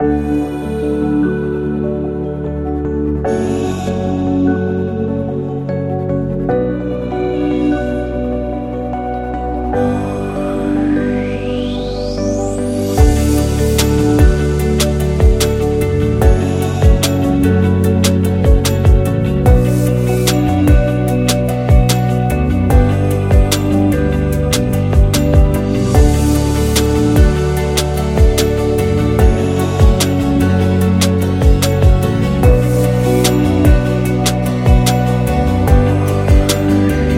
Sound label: Luxury RB